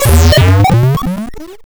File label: Super Space Bloops; FLEETCOM; bfxr